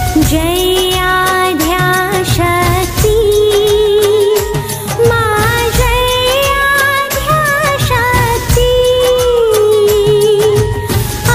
aarti

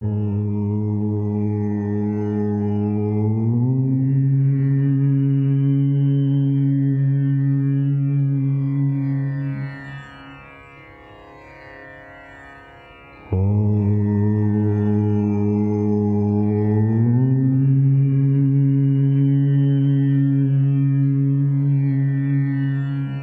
Om Krishnaya Namaha

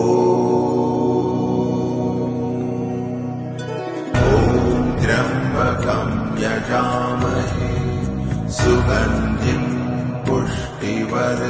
Mahamrityunjay Mantra

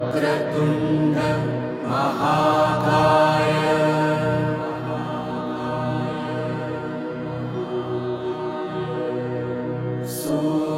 Vakratunda Mahakaaya Ganesh Shloka

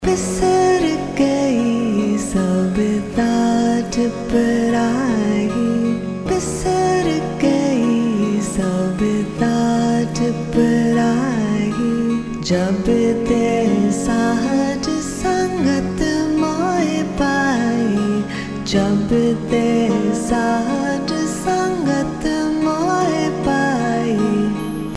Sikh Ringtone

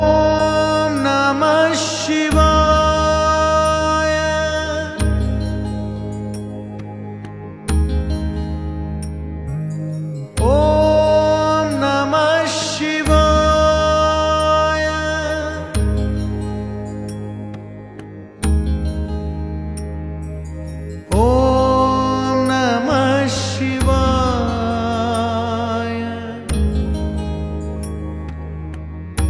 Shiva Mantra Aum Namah Shivaay

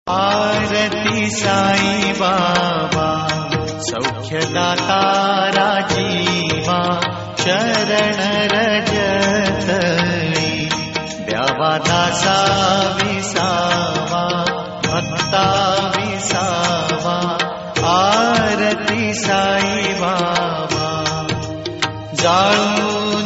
new sai aarti ringtone; saibaba

